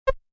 Multimedia, Beep, Button, Menu, Rollover, Web Page Or Flash Animation Sound